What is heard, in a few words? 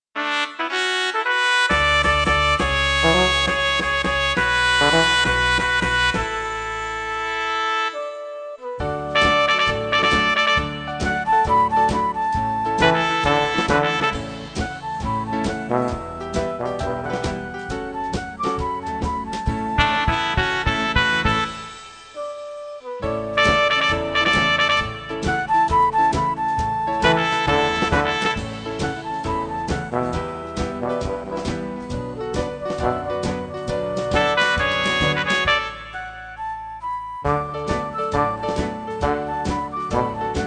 rain D major flute music